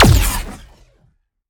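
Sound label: Sci weapon Shot Medium Laser Proton Popper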